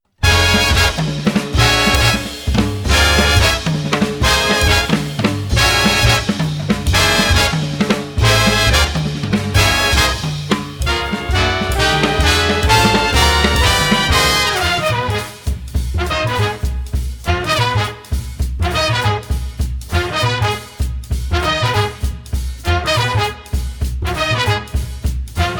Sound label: rhythm changes